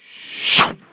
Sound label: swish